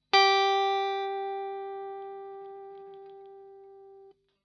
Vibrato Example

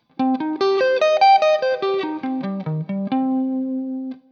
C Minor Arpeggio Shape, guitar

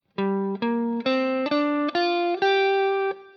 G minor pent shape 3 of 5, guitar

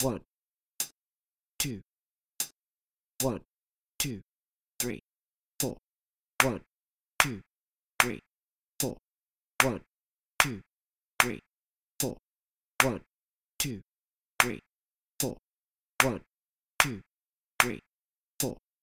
clapping

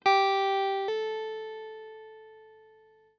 Hammer Example, guitar

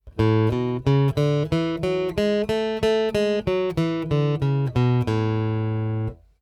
A Major Scale

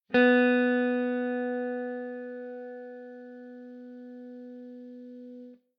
B Note, guitar

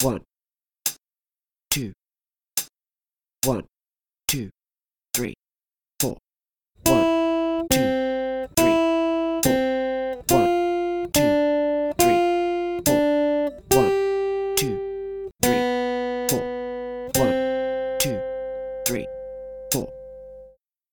2 Strings Longs, guitar

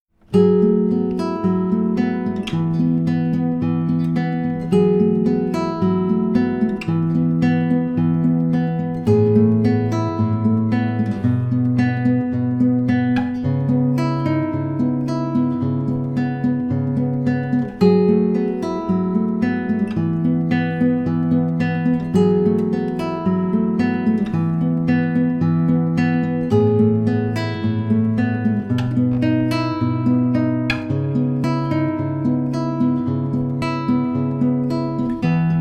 Fingerstyle Guitar; Fingerstyle Guitar V2

